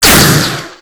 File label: sniper flyby